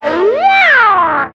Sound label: guitar slider